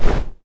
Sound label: light armor